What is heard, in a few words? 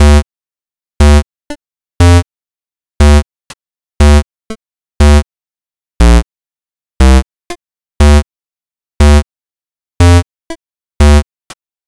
tense; mrpquest